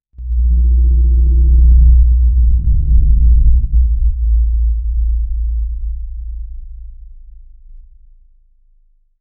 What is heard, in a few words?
loud deep croak with